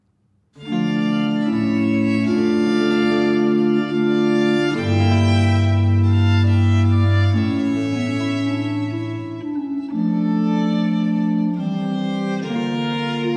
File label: Sonate 2 in E minor - 3. Adagio; Archangelo Corelli; Arcangelo Corelli; Corelli - Complete Works; Klassika